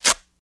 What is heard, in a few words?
Velcro Tear Apart Very Fast